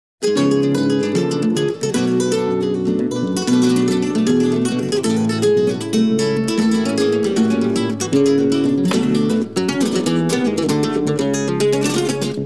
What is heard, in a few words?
Bulerias